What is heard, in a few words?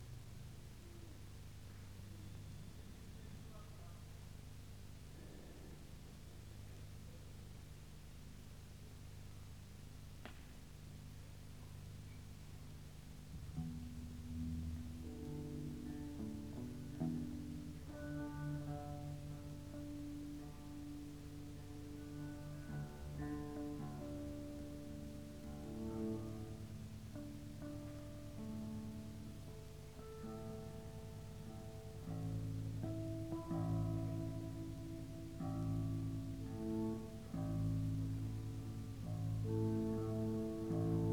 Tuning & Soundcheck